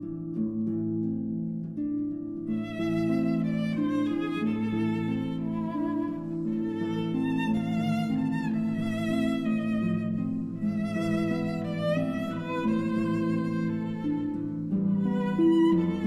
Berceuse, for violin and harp

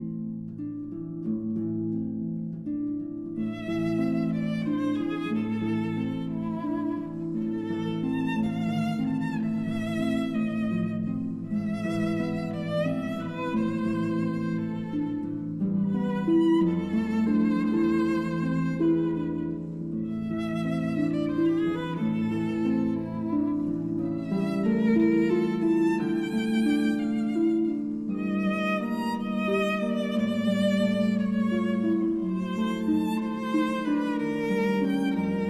Berceuse, for violin and harp

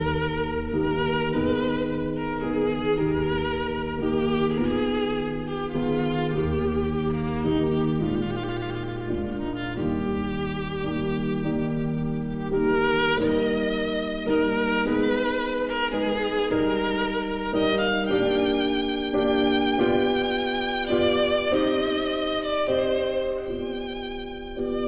Sicilienne for violin and piano

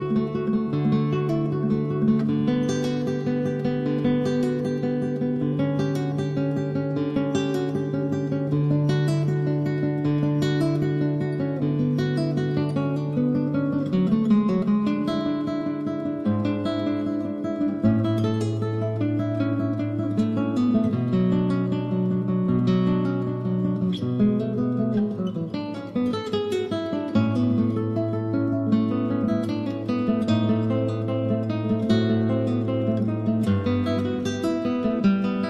Cello Suite No. 1- Prelude

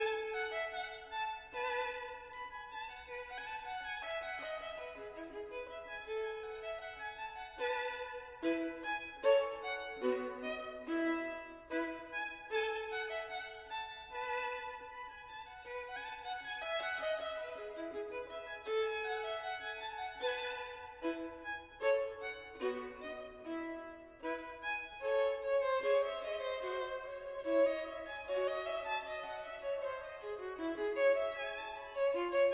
Gavotte en rondeau, Violin; Bach, Johann Sebastian

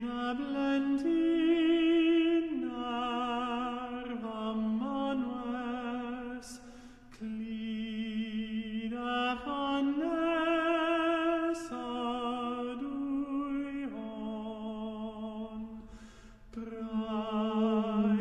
Traditional Welsh, Welsh Lullaby

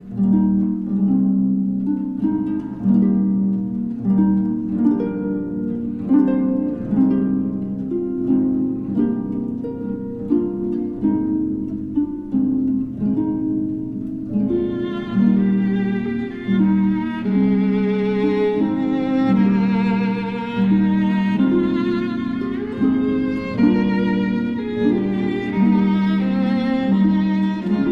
Gaelic Lullaby, Symphony Orchestra; Czech Radio Symphony Orchestra

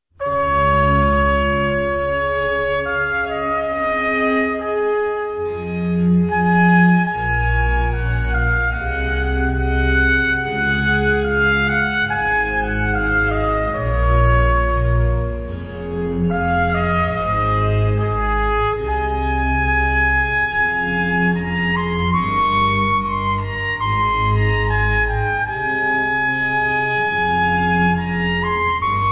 Trumpet Concerto in D- Allegro, Baroque Orchestra; Drottningholm Baroque Orchestra

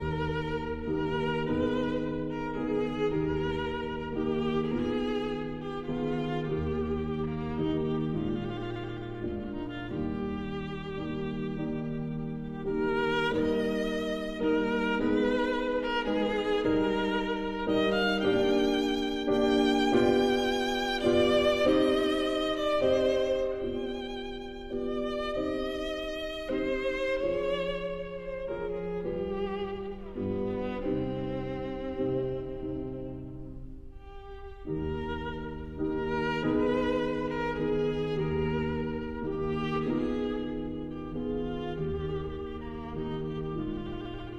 Sicilienne for violin and piano

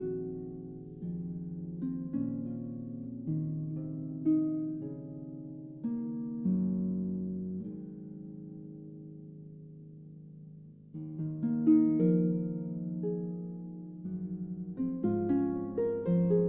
Harp Concerto Lullaby

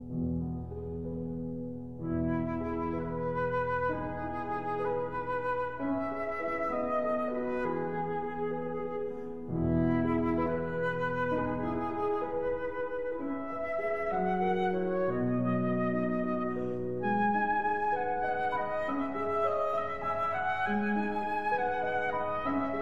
Berceuse (Lullaby) for flute and piano

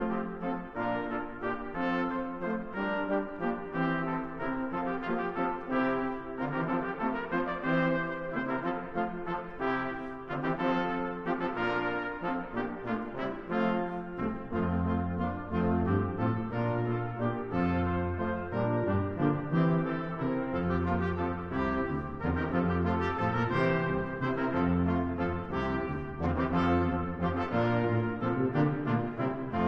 Gloucestershire Wassail, Brass Quintet; Traditional

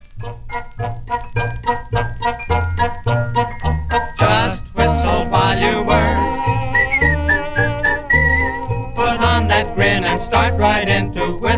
Vocal Trio

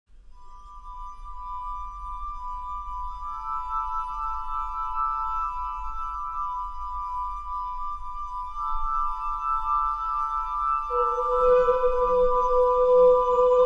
tuned water glasses; Stars

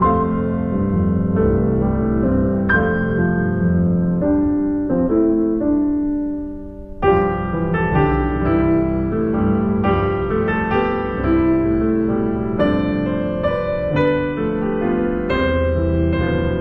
piano 4-hands; Debra Torok & Marylene Dosse; Christmas

